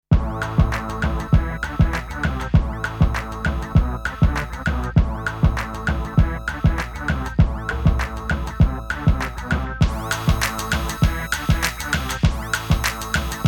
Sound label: Beat Bang